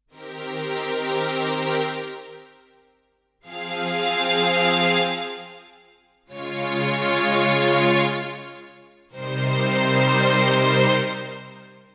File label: Kurzweil KaE1 Strings